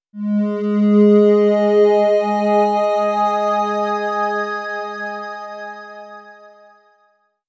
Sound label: FM Pad G#; Pads